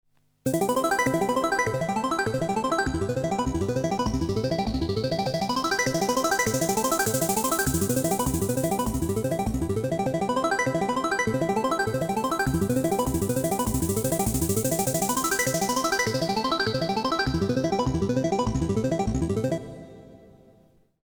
deep spring

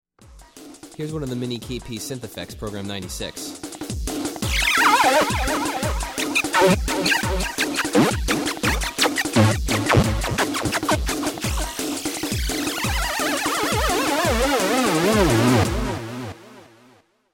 Synth FX

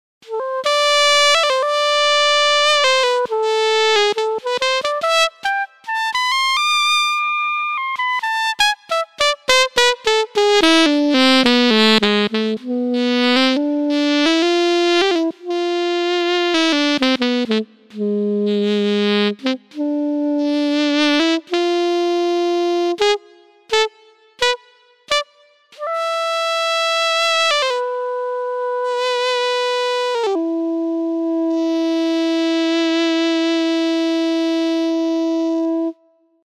Korg Prophecy VST Alto Sax

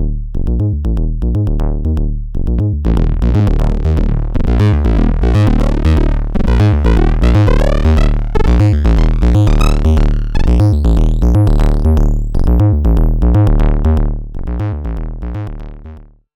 Reon RE501-R Driftbox-R Deep Angry Bass

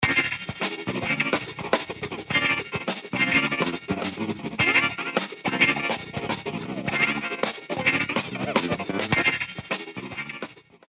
vocoder nature spacebud; els vocoder eiosis

